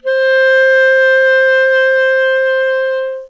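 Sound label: clarinet C octave1 vibrato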